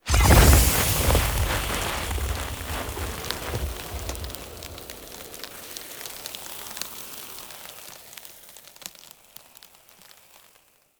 Fire Rain Pull; Powers; Fenn

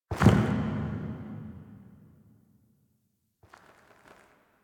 Slo Mo Steps; Ballas; Warframe; The New War; Finale

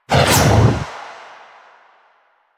Scythe Whoosh; Stalker Scythe

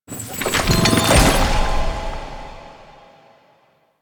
Bridge Form; Warframe; Cephalon Weave

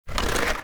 Wood Stool Drag Scrape; Objects

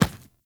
Inf Medium Run Default, Infested Footsteps; Enemies; Warframe

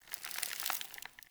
Squid Plant Snore; Objects; Traps

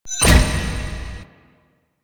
Stairs; Warframe; Entrati; Vaults

